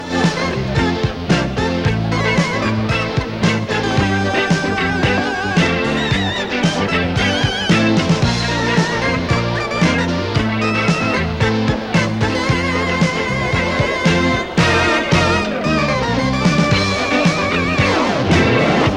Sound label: Dancefloor Synth Riffage